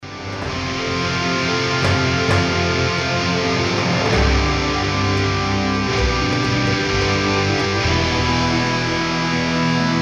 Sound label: Guitar Solo